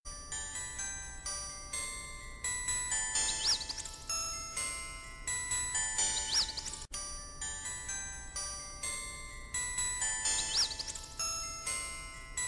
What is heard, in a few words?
Big Ringtone